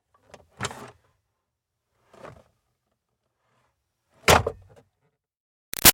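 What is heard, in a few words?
Car Highlander Glove Box Open-Close Move; 2005 Toyota Highlander V6; Rough; Cars; Vehicles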